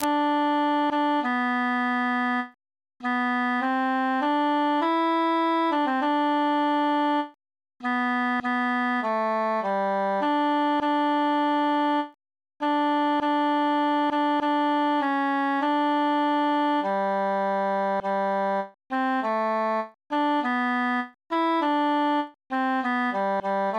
tenor solo; come again

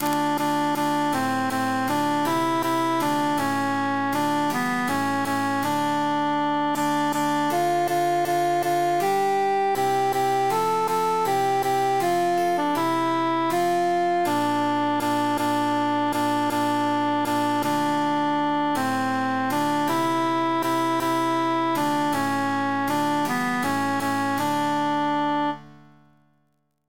altus emphasized; dindirin dindirin

